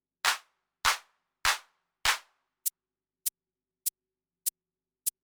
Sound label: Clap Maraca